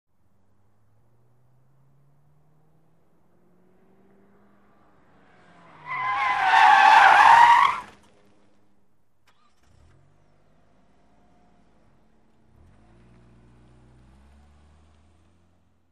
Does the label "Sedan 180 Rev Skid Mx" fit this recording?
Yes